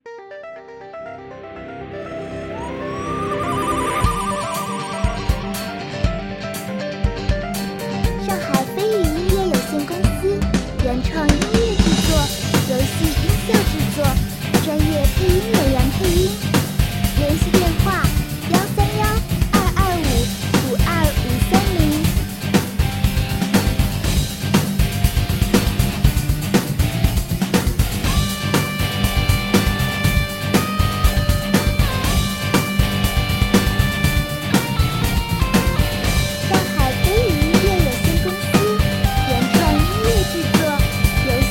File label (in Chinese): 摇滚热血; 全民格斗